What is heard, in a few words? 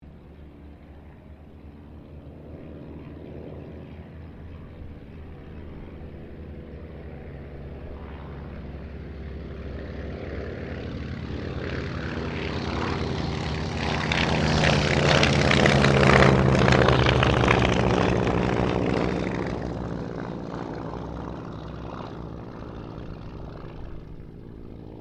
Ryan Ryan Auster pass; Mixed aircraft squadrons; Airplanes; Vehicles